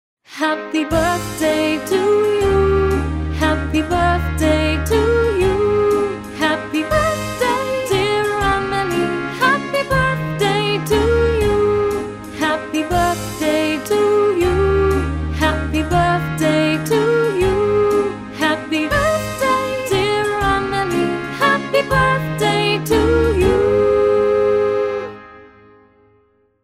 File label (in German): happy birthday amelie